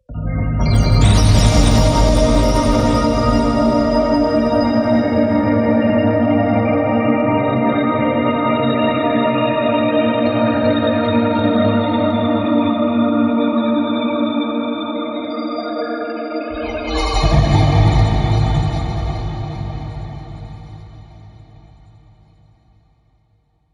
pad rainfall Eb, synths pads

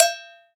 Cowbell

